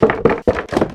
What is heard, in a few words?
Wood Roll; moul